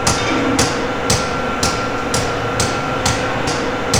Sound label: HYDRAULIC, Industrial; SFX